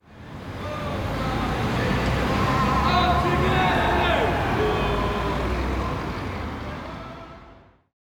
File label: Drunks